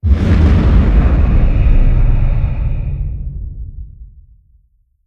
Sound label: distance dragon breath fire; Stellaris; guardians